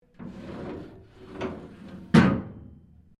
DOOR GALLEY SLIDING OPEN, Sounds onboard HMAS Castlemaine; Boats; Vehicles